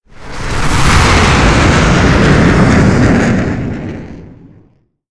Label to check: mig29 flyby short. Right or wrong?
Right